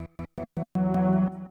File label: Industrial 160